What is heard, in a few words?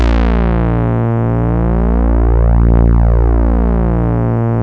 MINIMOOG; Rubber Chicken Software Sample Bank; OLD SYNTHS